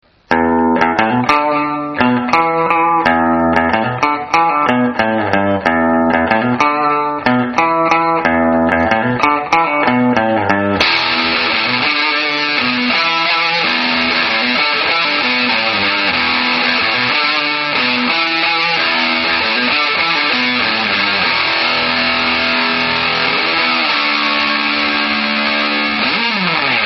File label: long sastain